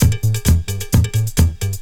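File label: DISCO LOOP, Techno Trance; E-MU Formula 4000 Series Vol. 2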